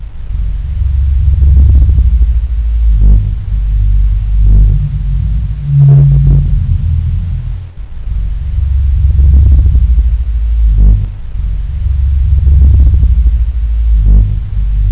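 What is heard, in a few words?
low tech ambience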